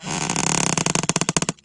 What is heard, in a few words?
bend; oscar fairplay